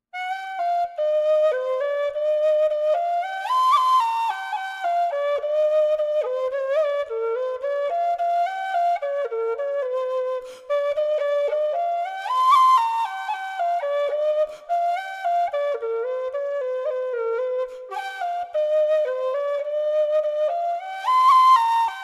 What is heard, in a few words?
whistle F slow reel